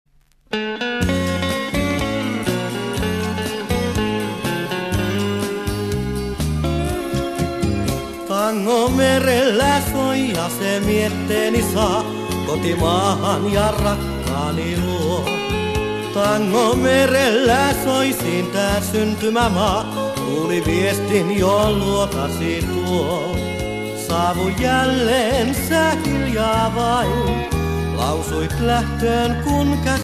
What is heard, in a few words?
Tango